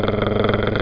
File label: grrr; pups